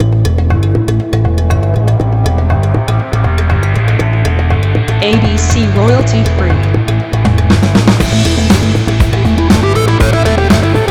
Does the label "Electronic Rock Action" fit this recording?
Yes